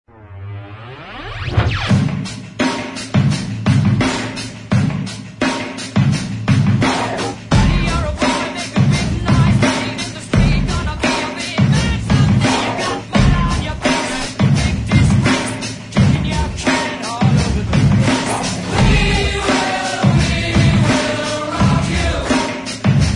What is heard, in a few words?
Drums